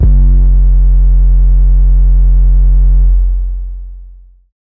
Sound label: Dusty 808 F, hip-hop drum kit